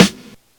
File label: SNARE; E-MU Formula 4000 Series Vol. 1; Hip Hop Nation; Bonus Perk